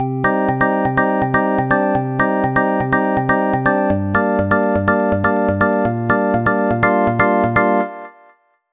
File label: Chords Rhythm House Deep House; midi wizard 2; Unison